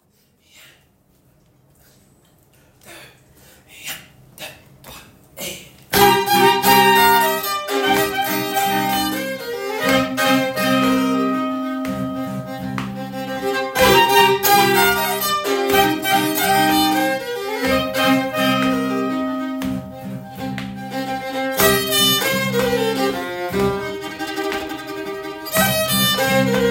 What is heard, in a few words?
Rondo II tutti